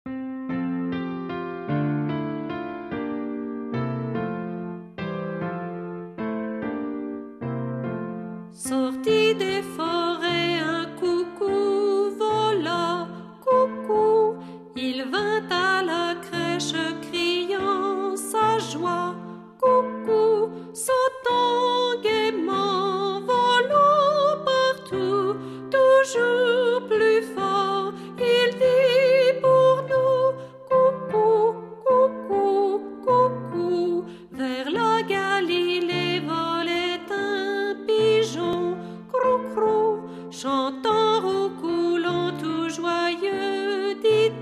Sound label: words and music; childrens songbook; french